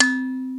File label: Kalimba, Roland JD-990; Drum Samples